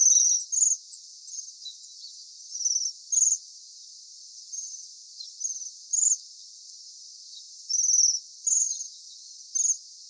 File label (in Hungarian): csonttollu Bombycilla garrulus